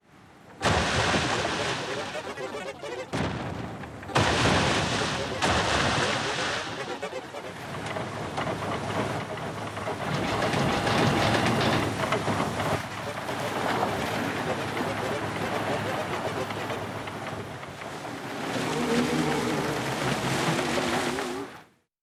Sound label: Naval Battle